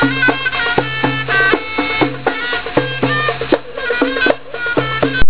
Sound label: Restaurant Musicians; egypt